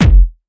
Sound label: Hardbass Kick